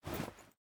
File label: footstep cape; smite; Apollo